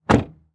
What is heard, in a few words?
Transportation Car Monte Carlo Close Door Exterior